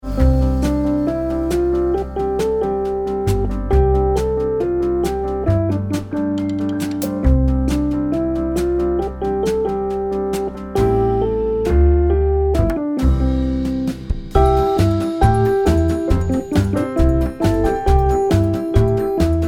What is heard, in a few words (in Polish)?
karuzela podklad z melodia